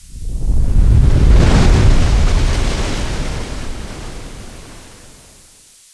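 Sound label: geyser sound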